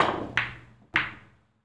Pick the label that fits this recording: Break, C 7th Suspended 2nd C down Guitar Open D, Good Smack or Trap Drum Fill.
Break